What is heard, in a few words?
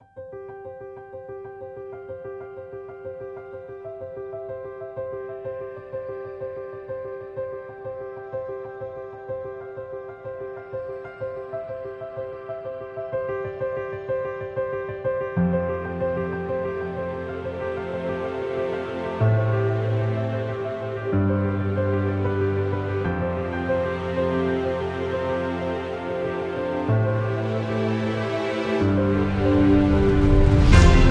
Epic Majestic Piano; SilverBoxStudio